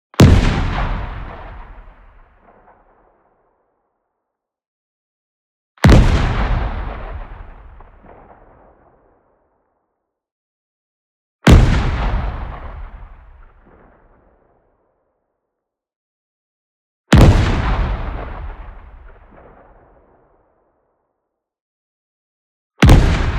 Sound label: EXPLOSION SHORT Distant Hit; boom library; Anime Essentials